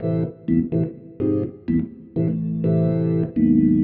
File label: DEEPHOUSE ORGAN 125 A